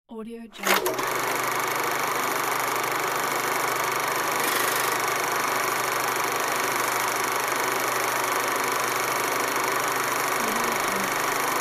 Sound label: movie projector